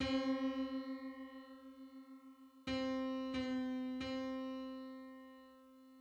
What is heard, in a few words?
Five-hundred-twenty-fifth harmonic on C; MIDI